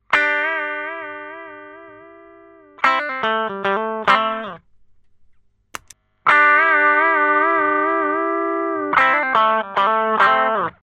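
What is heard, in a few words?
Without / With Sardine Can (Compressor + Rectifier + Color Brown), guitar; Celmo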